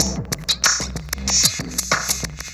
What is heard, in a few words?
Orbish Funk, Synchro Funk, Beats